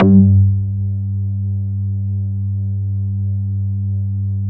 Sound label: PH FFAT G4, Bass; Distored Reality 1&2